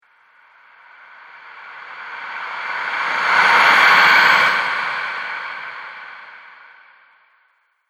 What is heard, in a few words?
Shadow Spirit, ghost; horror